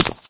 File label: footstep, wraith; doom3